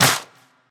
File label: subtle reverb hand clap c key, percussion single hits one shots